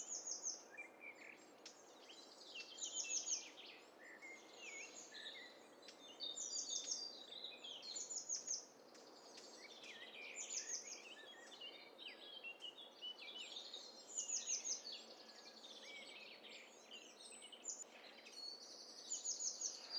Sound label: STEREO BIRD COCKTAIL PARTY